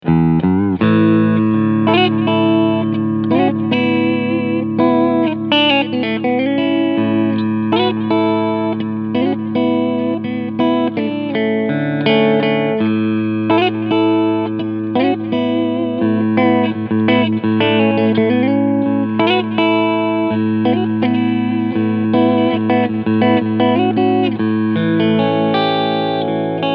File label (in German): Cleansound beide PUs Alter Switch ON; Ibanez AZS2209H